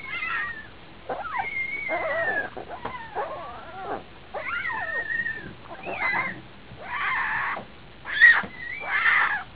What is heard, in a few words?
jaguar babies hungry